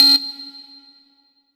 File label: LEAD Goodbye Bleep; Polyend; Plughugger